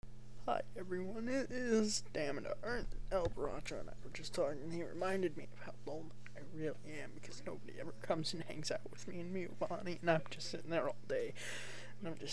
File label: crying